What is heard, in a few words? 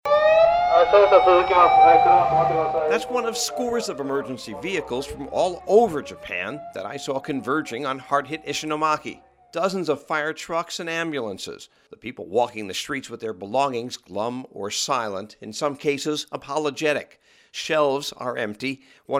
Emergency vehicles converge; hard hit Japanese town; japan hit by devastating tsunami